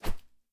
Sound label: arrow fly